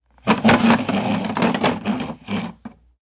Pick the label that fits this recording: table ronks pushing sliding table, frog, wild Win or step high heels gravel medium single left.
table ronks pushing sliding table